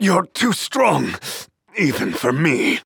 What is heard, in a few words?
Death; Darklord Skin01; Zhin; Paladins